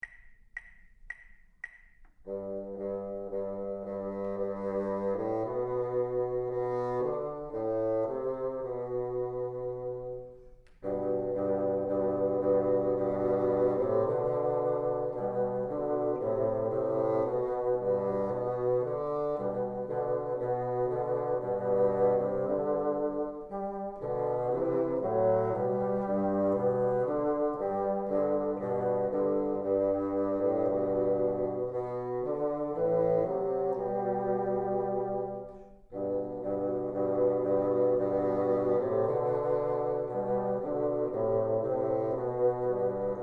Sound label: together, bassoon